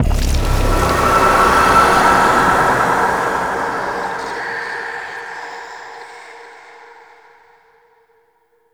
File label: Hex Appear; Warframe; NineteenNinetyNine; Stage11FuturePast